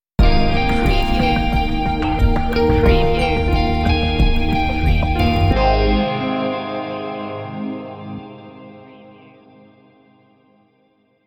hidden beauty stinger

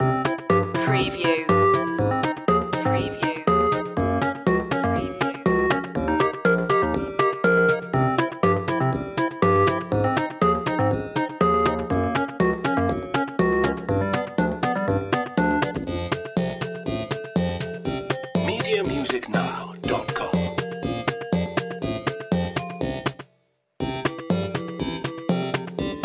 8-bit boss loop

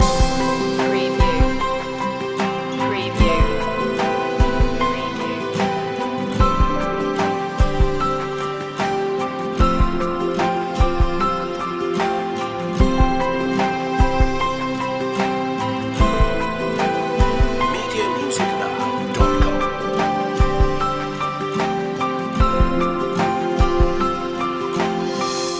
aquatic world loop

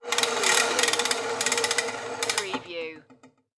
Extension Cord Extend; sound-effects-bible

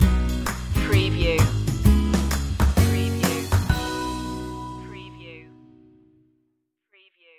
i feel alright stinger

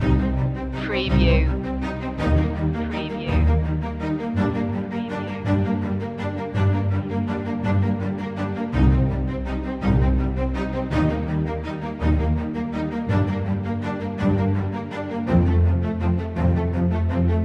stirring times loop

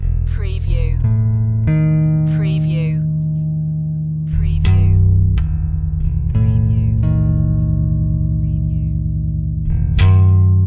Hip Hop Bass Guitar Loops 90 BPM - E

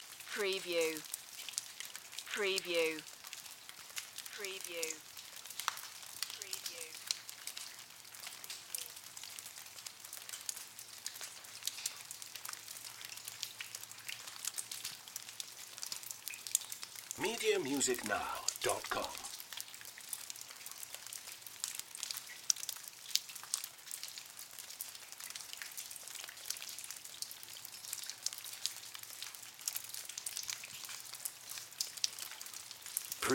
Cave Water Drips

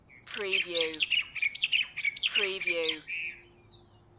Honolulu Morning Bird Close Up Short

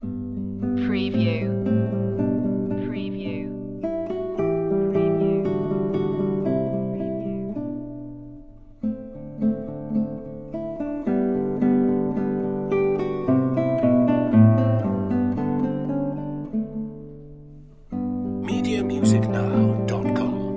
andantino g major; classical music for media

